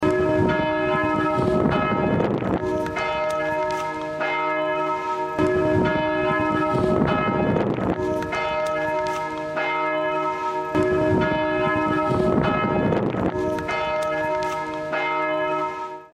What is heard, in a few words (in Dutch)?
klok; Odulphus